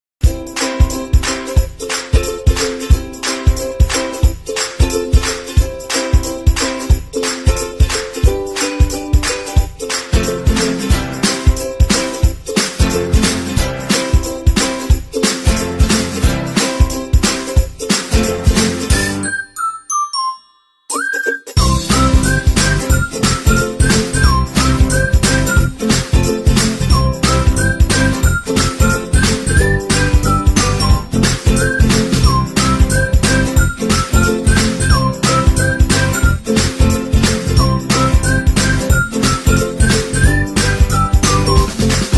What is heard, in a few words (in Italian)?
MUSICA